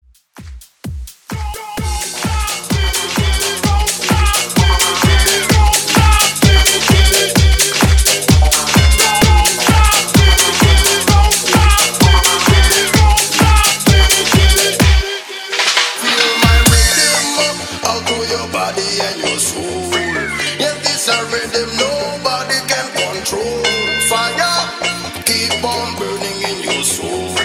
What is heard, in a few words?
throwback 90s hype in outro redrum 129 bpm